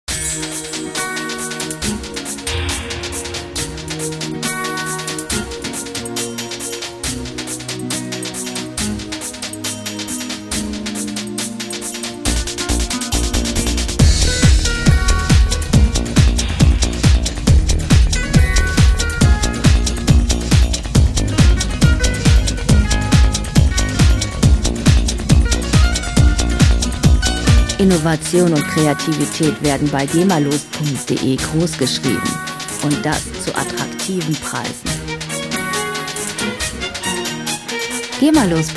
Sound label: samba